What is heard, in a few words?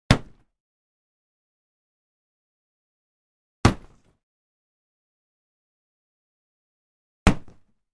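sledgehammer blow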